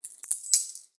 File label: Coins